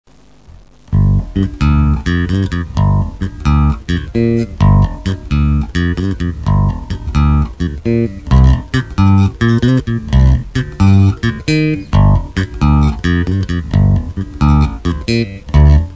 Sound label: Bassgroove